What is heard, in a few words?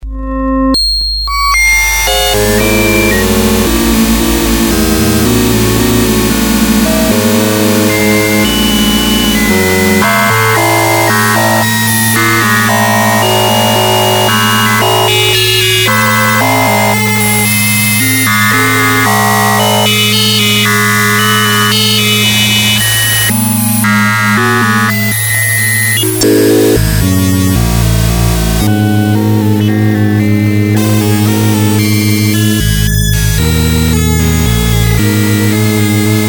PSR-12, Circuit Bent